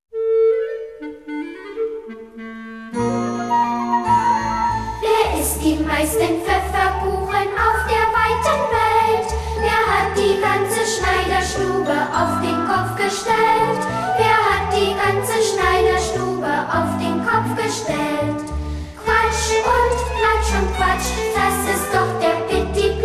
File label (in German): Lied; Pittiplatsch; Herr Fuchs und Frau Elster; hoerspiele